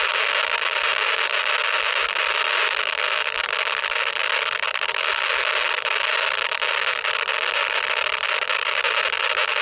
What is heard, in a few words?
Harsh Static